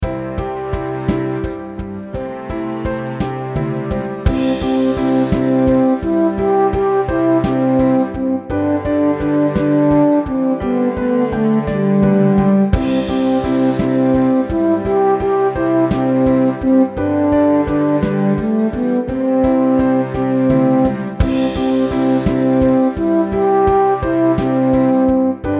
Mulberry Bush Multiple Verses SLOW Tempo, ukulele; Rainbow Ukulele Play-Along

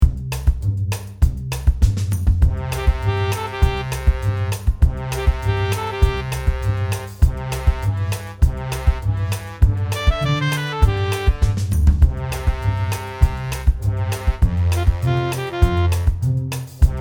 NO MELODY, ukulele; Rainbow Ukulele

